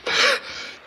Fatigue Light; 1916 der unbekannte krieg gamerip

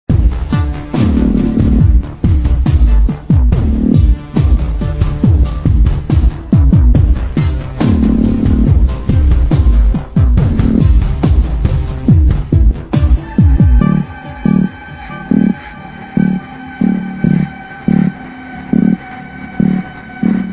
Music Loop; gamerip; supermechs

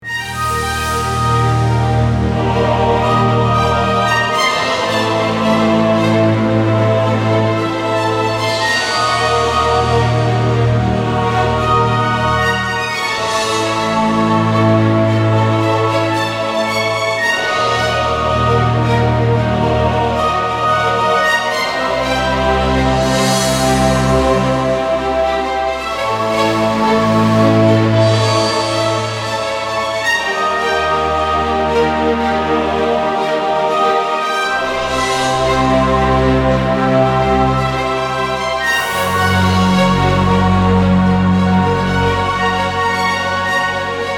Battle Ebm 112bpm; Disney Infinity 2.0 Marvel Super Heroes; Arachna

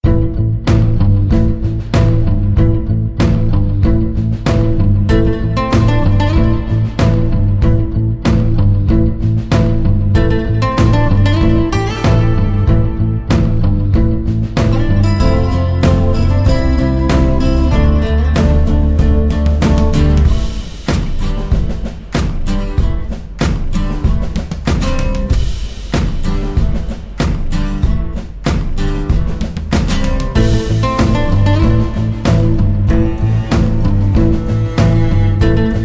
Ingame Loop, Mono; COTLI

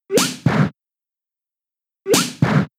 Slap; pokemon sfx gen 6 attack moves xy oras; Double Slap 2hits

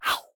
Ui Close; Nearby; Portal Quest

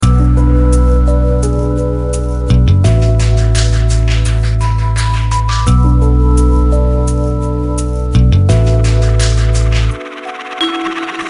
Loop

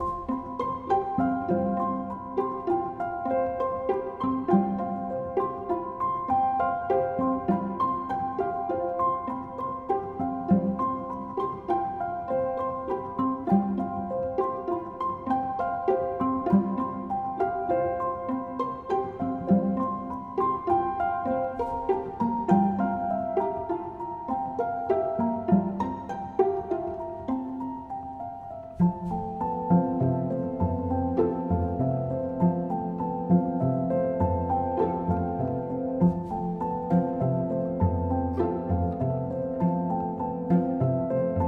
rainforest bgm low 100bpm CMajFMaj; loop; chicory a colorful tale; ps4

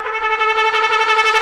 trumpet danger

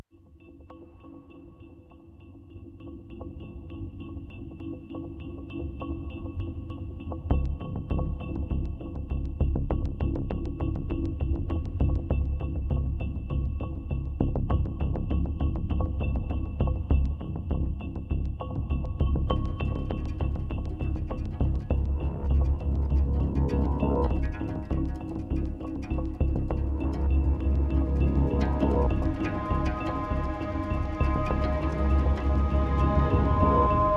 Default Loading Music

